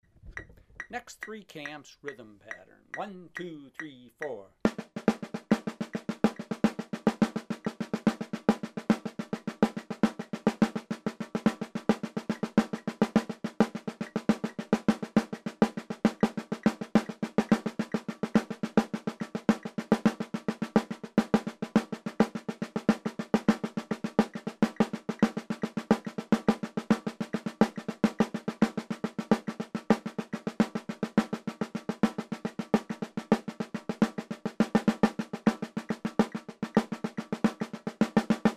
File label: three camps rhythm pattern